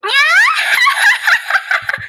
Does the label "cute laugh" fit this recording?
Yes